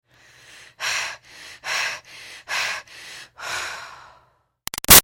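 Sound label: Human Female Breaths Exercise Steady-Slow; Breathing; Big Room Sound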